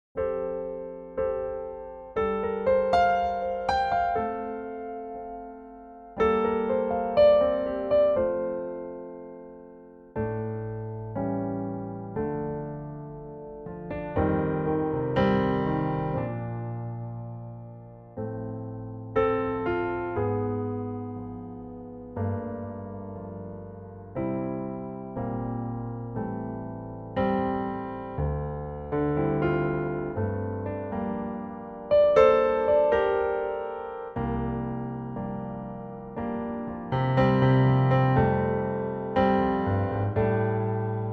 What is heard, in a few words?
piano; key - F - vocal range - (optional C) F to A